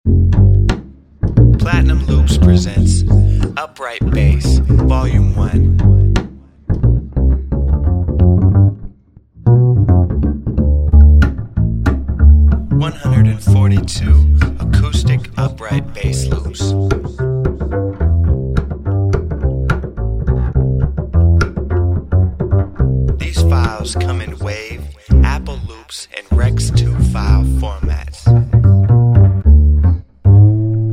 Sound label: upright bass; Jazz loops